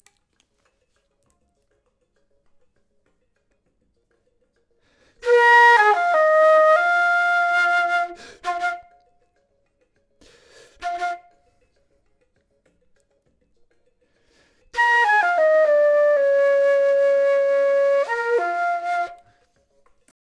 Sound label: flute; logic